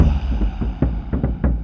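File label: Music Shield; from dust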